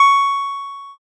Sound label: ding